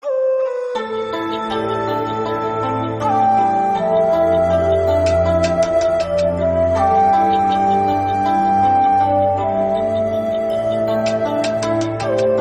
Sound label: city bgm; Disdain for the World; Longcheng Ltd; Android